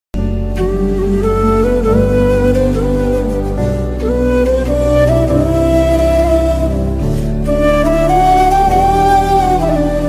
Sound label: tamil love ringtones sad bgm ringtone; oh my kadavule